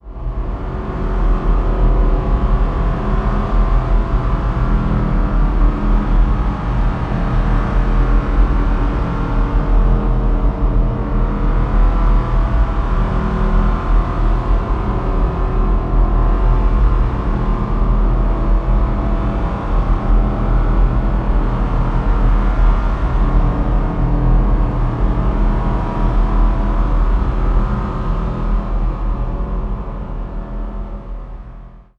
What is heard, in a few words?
ambience texture, Science Fiction; Bluezone Corporation